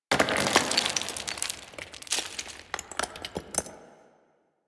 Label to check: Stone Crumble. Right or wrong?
Right